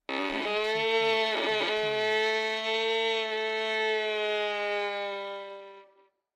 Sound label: Violin Scary Movie